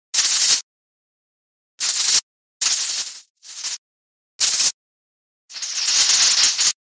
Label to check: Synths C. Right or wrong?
Wrong